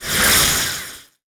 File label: Multimedia Internet CD-Rom Flash Whoosh